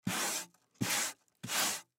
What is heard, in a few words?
Plywood Wipe Off, Plywood Wipe Off Dirt Hard Slow; Materials; Industry; Blastwave